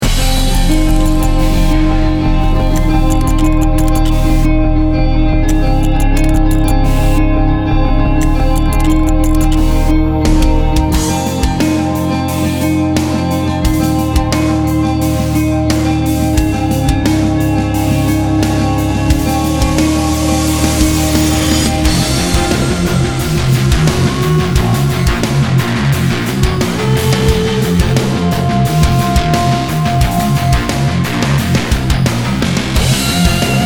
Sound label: ROCK